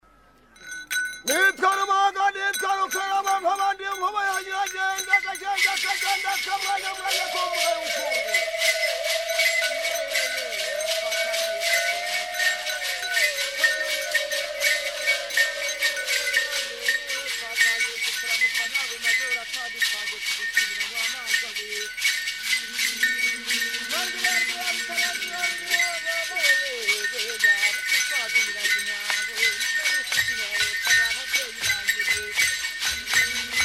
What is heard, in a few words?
inzogera, rwanda